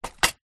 broken picture frame containing loose glass shards place down on wooden tab; table; zapsplat